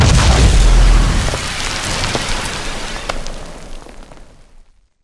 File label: generator destroyed explo close; coop; Titanfall 2